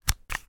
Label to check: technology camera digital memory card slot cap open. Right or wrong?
Right